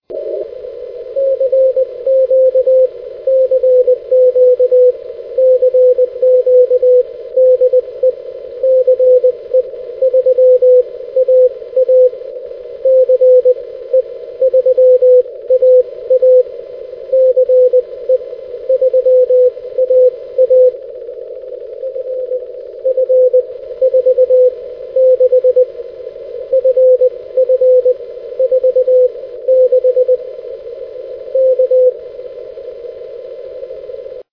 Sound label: 6m beacon; F2; CE3AA/b; 6 Meter; 5 September 2012 - F2; N1BUG